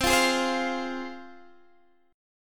C Suspended 4th Sharp 5th, C, down, Guitar, Standard; Csus4#5 strummed; JGuitar